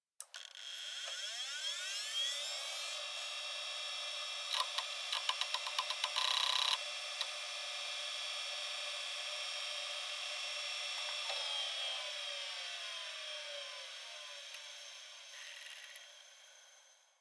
tech oneshot, office

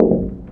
glass sheet step; physics

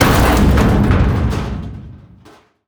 barrel fall; Counter Strike Model Pack; CS:GO; survival

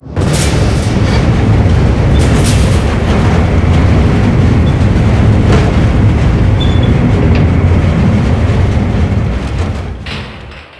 end gate open; ambient; Half Life 2; Episode 2; outland

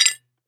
pottery impact, glass; physics

